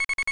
talk; baseq2

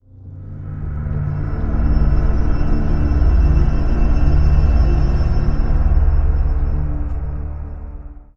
marine wave sound; spawngirlsounds

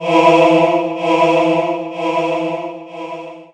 siphon; baseq2; items

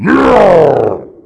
pain, monsters; zaero; sentien

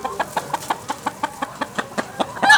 chicken; openffa; aggrocult

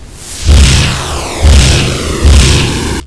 shock fly

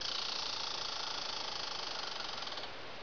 rattlesn

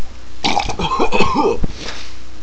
gasp

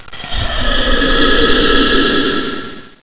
death; wraith2

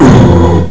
fall; rhino

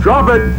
pain; robocop

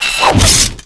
attack, berserk; baseq2

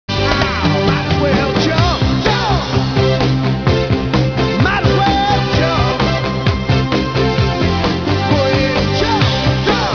jump

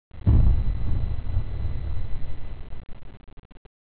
sphere

